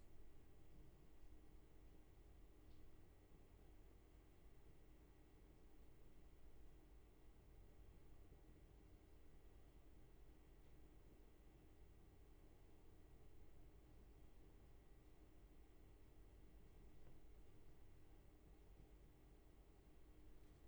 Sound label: room tone